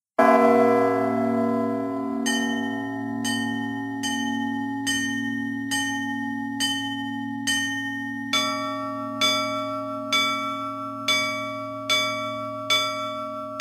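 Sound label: bell ringing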